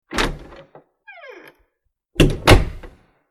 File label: door open and close; dropin meetings